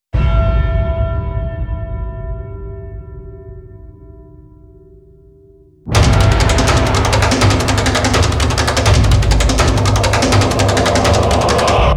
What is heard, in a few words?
drums